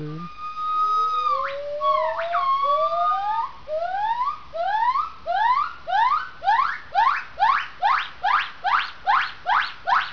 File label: Duett, gibbons